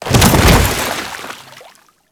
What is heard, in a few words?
Grineer Fall Water, Body Falls